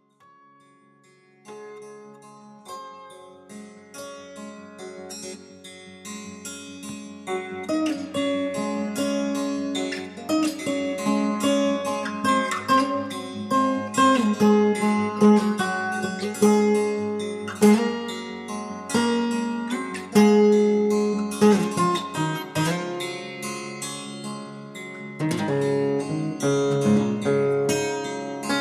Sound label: Acoustic; PSA